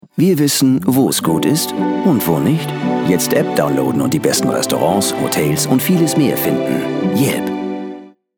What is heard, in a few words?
yelp